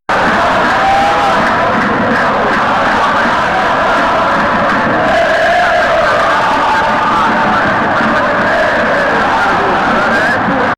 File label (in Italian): Eh eh oh ah Sampdoria in serie a